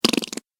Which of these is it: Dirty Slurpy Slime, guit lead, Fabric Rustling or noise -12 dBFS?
Dirty Slurpy Slime